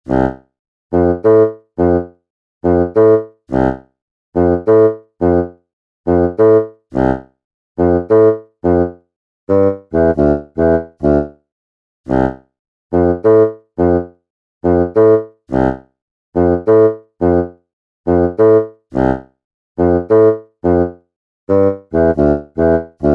cheesy bassoon (loop); OpenBundle; Background Music and Loops

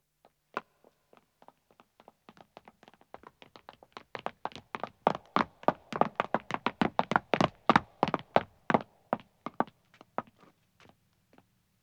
Stone Running, 2 Men Approach, Footsteps; BBC Sound Effects Library; Footsteps II

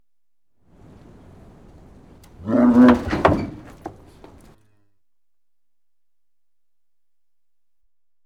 Cows Startled In Cattle Crush; Livestock; BBC Sound Effects Library

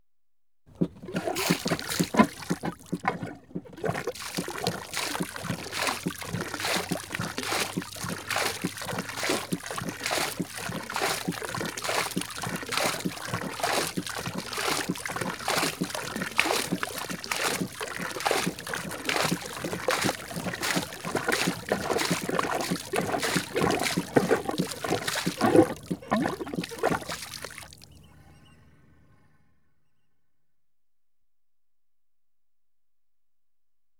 30ft Yacht, Pumping; Ships And Boats; Alice MAY; BBC Sound Effects Library; Ships And Boats 2

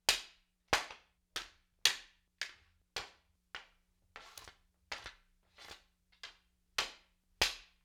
Spear Katana Haft on Blade; StillNorthMedia

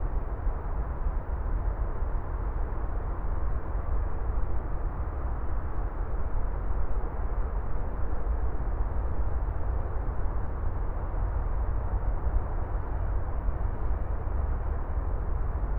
Distant City Ambiences; Detunized

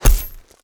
Chainmail Impact Hard; Impacts; Double Trouble Audio

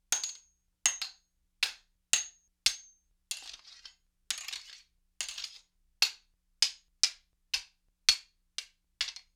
Mace Sabre Blade, Weapon on Weapon; StillNorthMedia

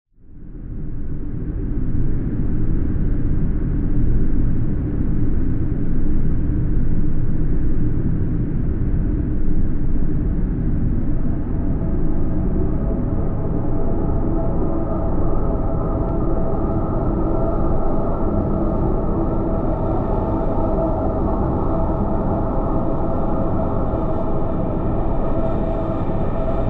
DRONE Hell Swarm; SmartSoundFX; Dark Textures

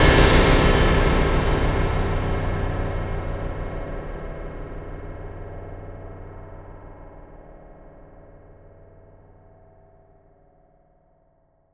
Cimpact sound, Industrial Samples and Impacts; Bluezone; Cinematic Session Industrial Samples and Impacts

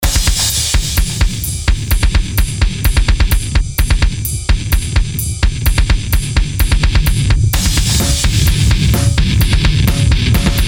Dramatic Metal Entrance (loop); OpenBundle; Background Music and Loops

